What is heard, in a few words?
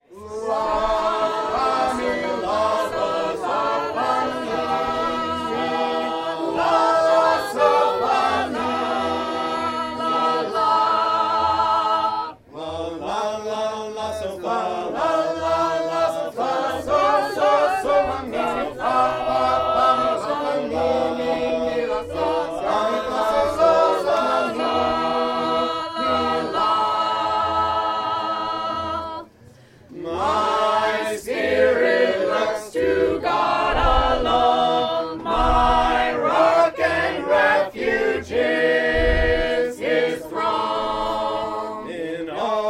Sacred Harp Singers, live; 107 [Russia]